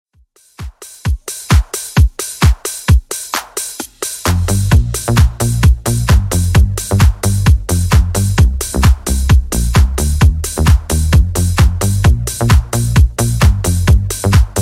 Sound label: 90s Redrum 131bpm CLEAN